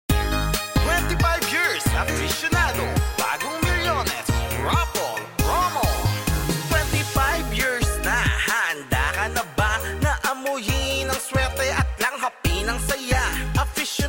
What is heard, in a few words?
Jingle